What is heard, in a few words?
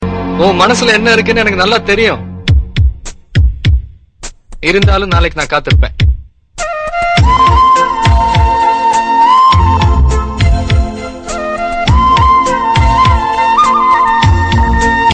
Melody Tamil Melody Ringtone